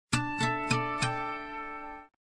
Power Off, System; Nokia; 7705 Twist

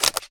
capture shutter, camcorder; Samsung Z4; mm-camcorder

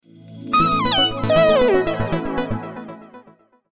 Light messenger, phonetones

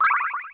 connect tone; Samsung SGH-i740; System Sounds

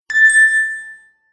Alert, Samsung SGH-i617 BlackJack II

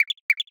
Chitter, Natural Elements notifications; Google; Google Sounds

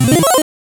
Power Up; PlusFourSix; Ying; Paul Bergman

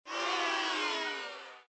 dice

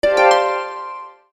New Message Tone; NEC Casio MEDIAS-WP-N-06C

